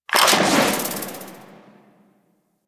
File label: Summon Drone; Warframe; Veilbreaker; Venkra